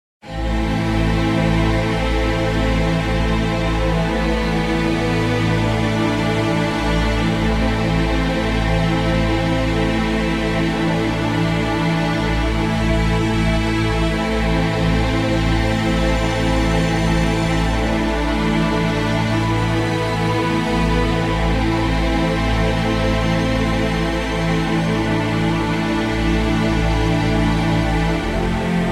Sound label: Orchestral Mix